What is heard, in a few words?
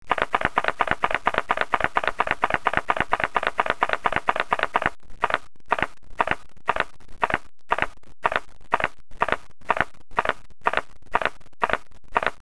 Sony Alpha DSLR-A700 raw hi